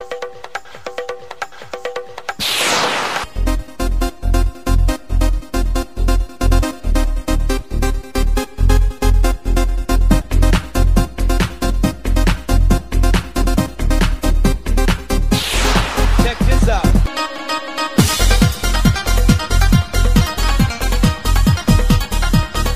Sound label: arabic ringtone